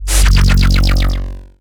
Boss Attack

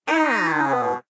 Cyborg Sad; Cut the Rope: Time Travel

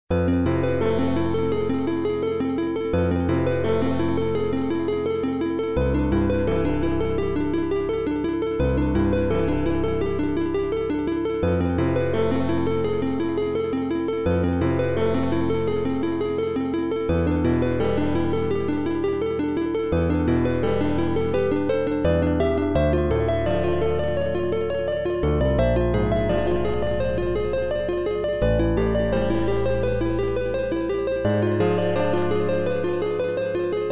Dungeon Rest; noel and the tower of doom; rpg maker

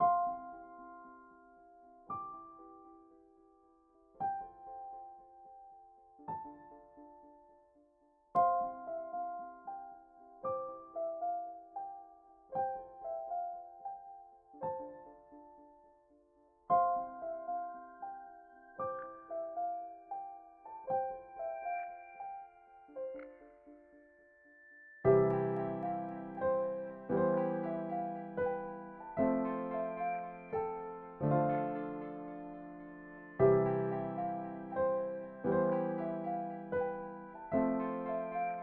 Piano Looped

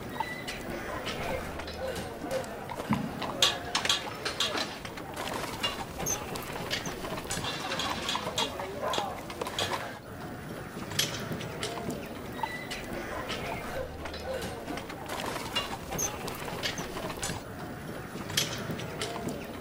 castle courtyard

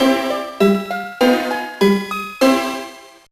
All Candies Collected; The Polar Express; GBA

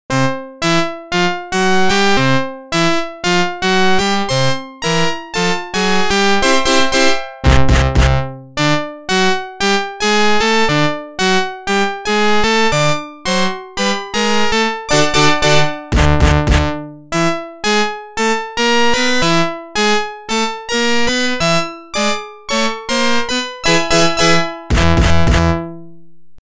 Organ

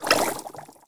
Fluid Vitesse FX Water

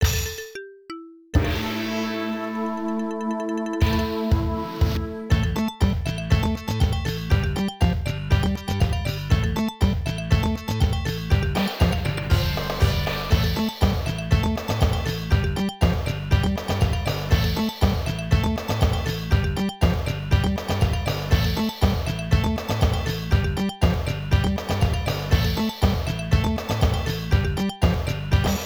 Chinese Restaurant BGM; Quiz King of Fighters

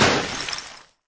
snowball breaks; Angry Birds Seasons

